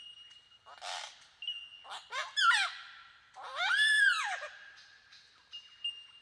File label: Superb Lyrebird Menura novaehollandiae